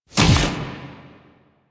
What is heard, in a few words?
Quick Draw Shot; Warframe; The New War; Quests; Weapons; Omicrus